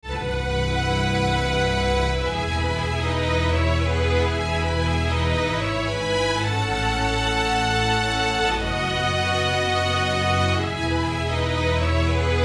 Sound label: Control Strings